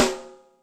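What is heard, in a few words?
RIM hip hop, Acoustic Snare; Yamaha DTXpress; drum machine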